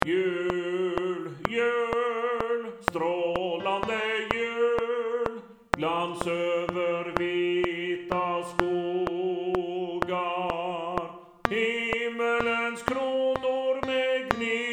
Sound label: bass 2 click